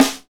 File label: SNR, Hip-Hop Kits, Roland; Northstar - Drumscapes Roland